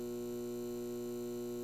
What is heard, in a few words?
Fluorescent Hum; Warframe